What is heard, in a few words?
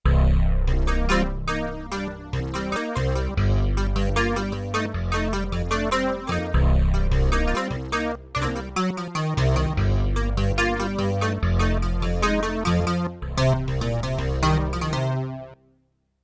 Funky Clavinet; Spacey Keys